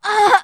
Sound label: hurt